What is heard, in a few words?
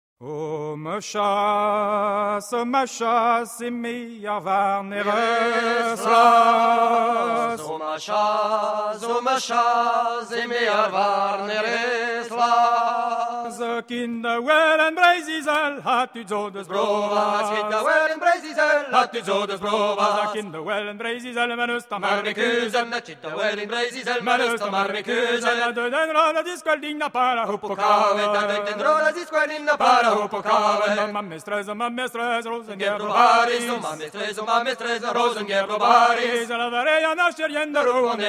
Gavotte; Bro Dreger; Lintanf